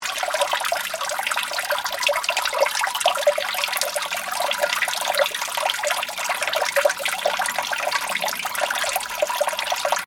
Water drain loop, Water: running from road into drain, slight reverb from drain, loop; Water Sound Effects; Stuart Duffield